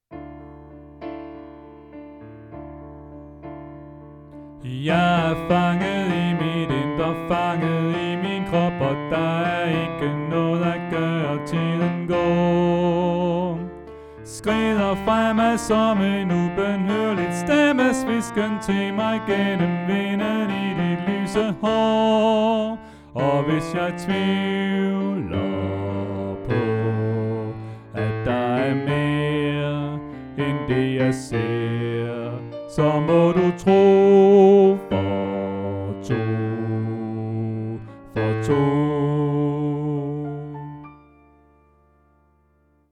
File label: Bas; oevefiler